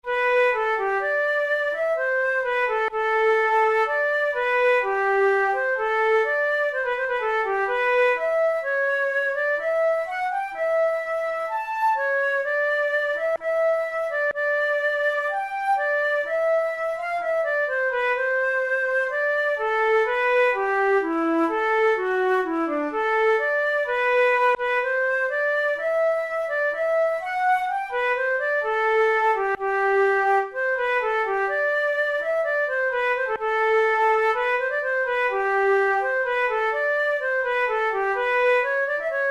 gavotte, flute

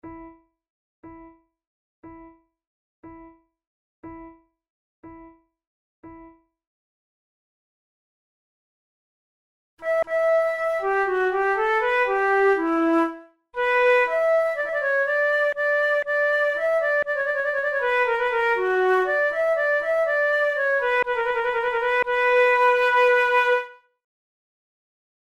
allemande, flute

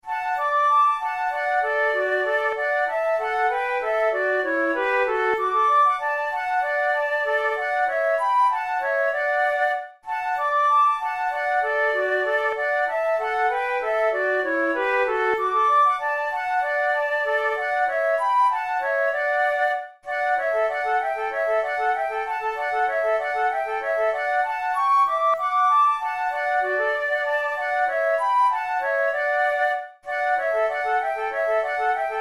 duet, flute

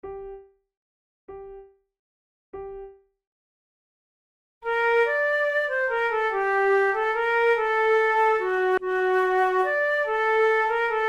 claudine lived contented duet, flute

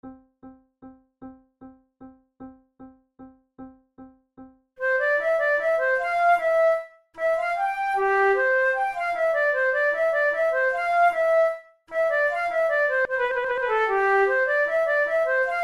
menuets; Naudot; 6 babioles

